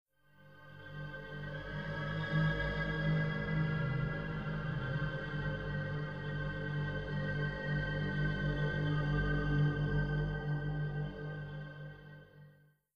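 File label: melody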